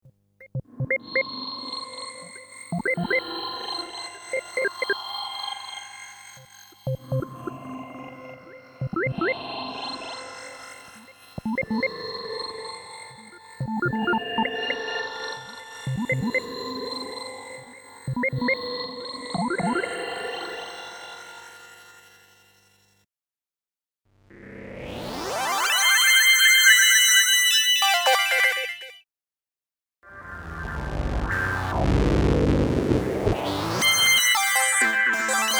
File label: Kaossilator